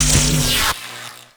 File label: Shield Spawn C; Enemies; Weapons; Warframe; Arachnoid Camper